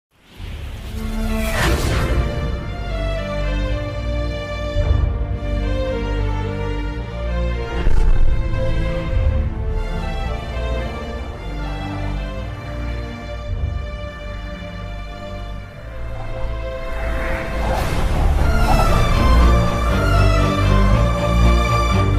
love bgm; anegan

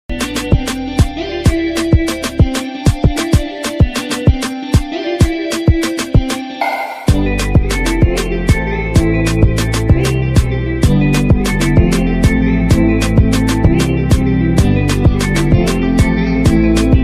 ringtone instrumental ringtone trending ringtone

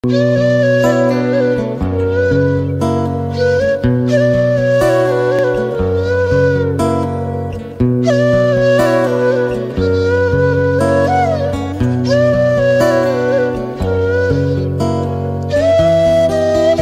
flute version bgm

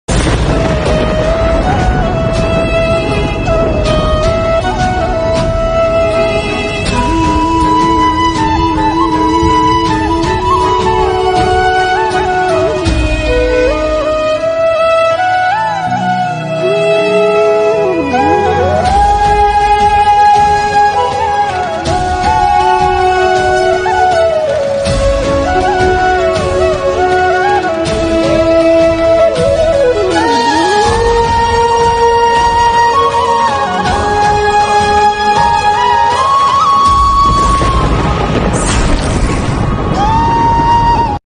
sad bgm; divyadrishti; divya and drishtis

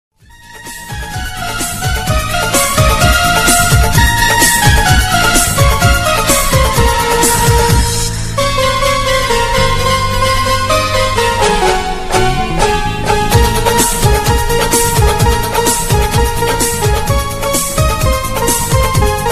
instrument ringtone, bollywood song ringtone

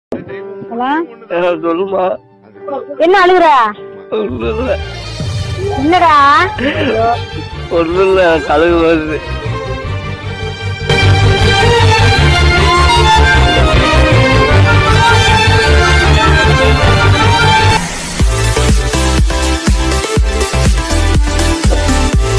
tamil amma bgm ringtone background music

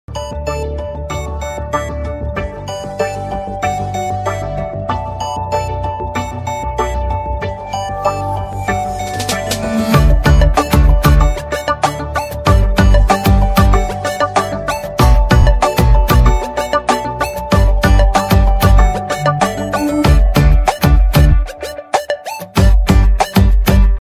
Tamil Christian ringtones; jesus ringtones; christian bgm

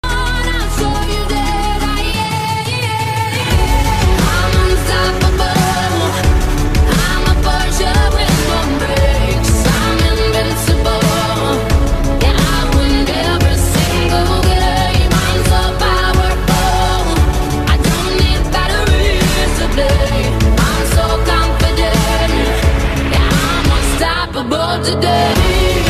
ringtone

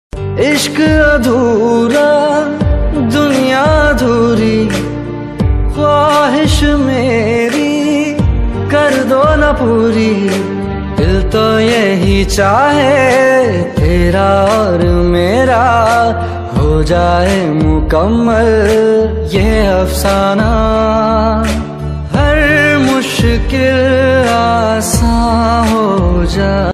sad ringtone

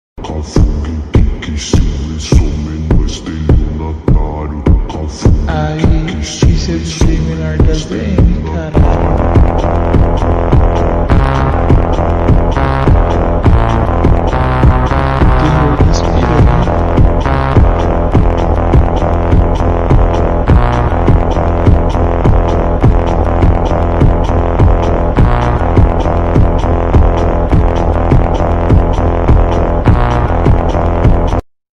slowed reverb ringtone; zoheb ringtones; tiktok insta reels bgm

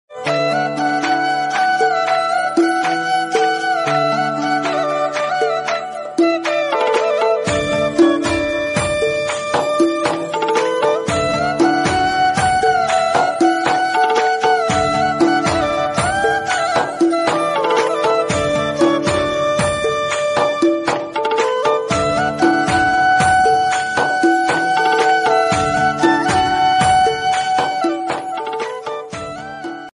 Instrumental Ringtone Bhakti Ringtone

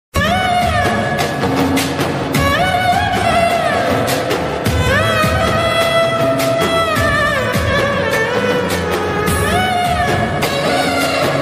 love BGM ringtone; thandel movie

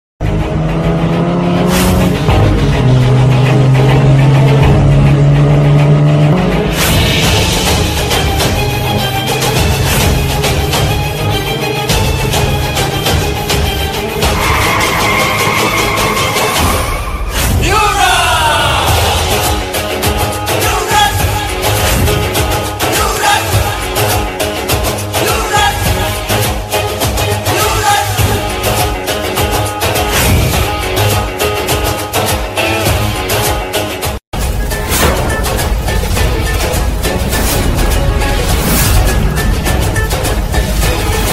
bgm background music; tashan e ishq

